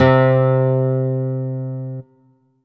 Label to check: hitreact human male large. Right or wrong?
Wrong